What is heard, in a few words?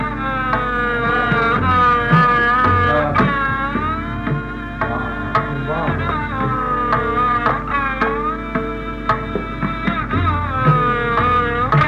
Raag Darbari - Sarangi